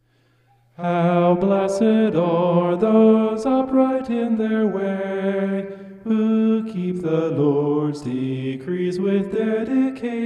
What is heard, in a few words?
vocal homoph; psalm 119